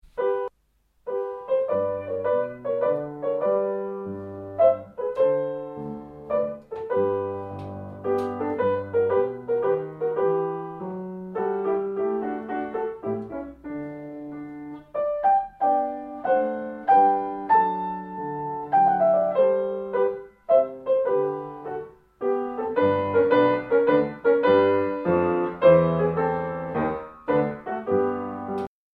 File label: minuet in G; Beethoven